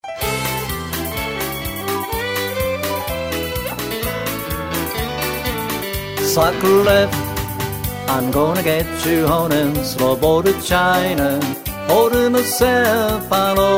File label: vocal